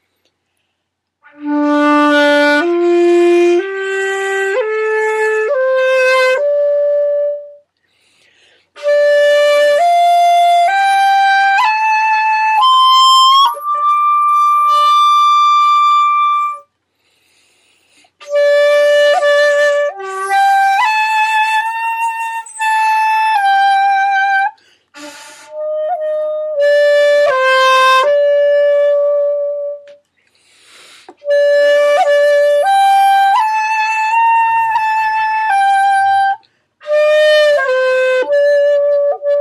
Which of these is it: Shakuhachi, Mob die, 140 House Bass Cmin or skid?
Shakuhachi